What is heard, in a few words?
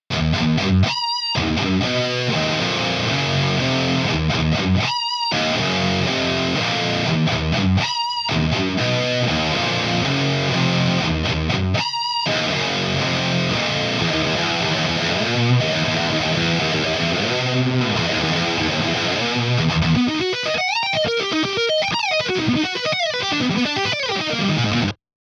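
Electric Guitars V30 Copper Pre Off; Mkiic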